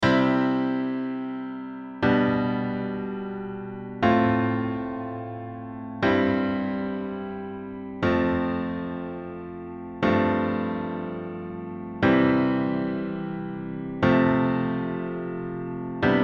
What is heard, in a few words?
onchord